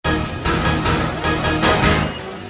para radio

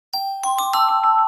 campanas corto

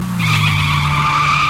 quema llantas neumaticos

